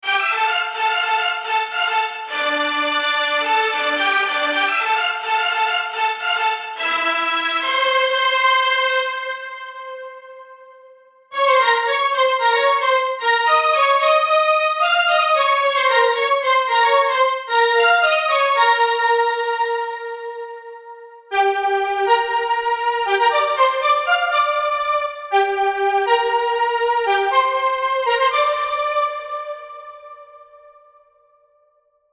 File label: Leads Disco; Unison; MIDI Wizard